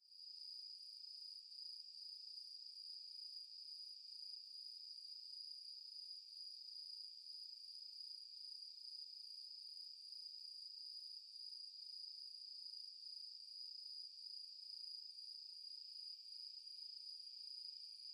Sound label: mushi nature few loop room; anemoi